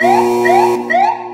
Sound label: Reactor Alarm; Electricity; DynamicEuropa